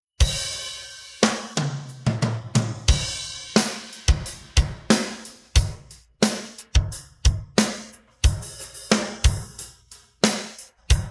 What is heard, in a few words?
drums pattern